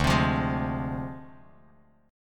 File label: D Minor 7th Flat 5th, Dm7b5 chord, Bass Standard; strummed